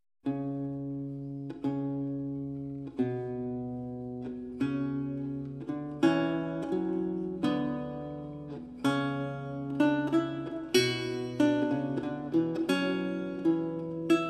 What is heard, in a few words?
Recercar, on renaissance lute